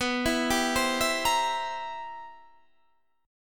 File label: C 7th B, C7/B chord; arpeggiated